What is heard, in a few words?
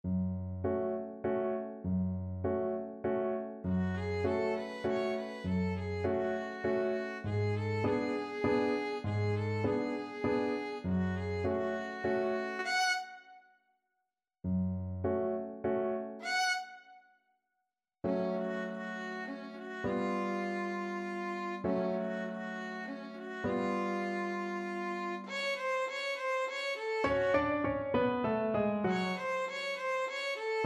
creak crunch scream, violin